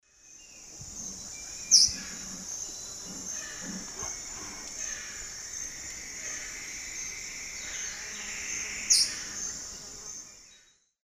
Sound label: Chloroceryle aenea, call; S. America; Eastern Lowlands